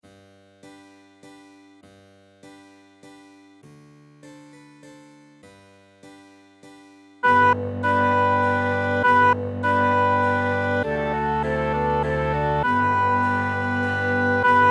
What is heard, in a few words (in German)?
Menueett fuer floete